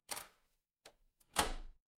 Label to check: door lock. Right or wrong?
Right